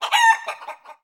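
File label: Chicken damage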